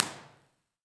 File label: Recording Suite, Impulse Response; Steinman Foundation; EchoThief Impulse Response Library